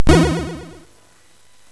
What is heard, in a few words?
SFX, MOS6581; Narco Police; MOS6581R4; hvsc